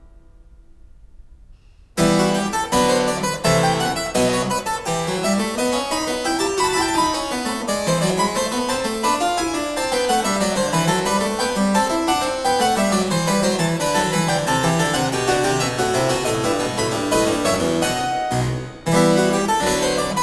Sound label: Harpsichord; Blandine Verlet; Bach; Complete Partitas for Harpsichord; 巴赫羽管键琴组曲全集